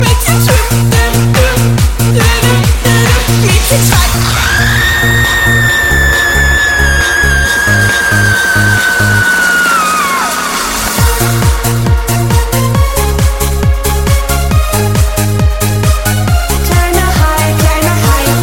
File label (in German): neuer deutscher electro klingelton